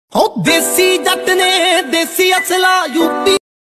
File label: Dance Punjabi Ringtone